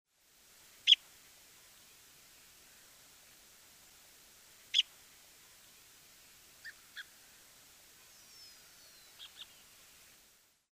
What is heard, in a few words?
Buff breasted Sandpiper